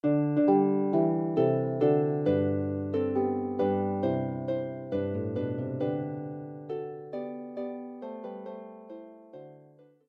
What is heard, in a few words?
Harp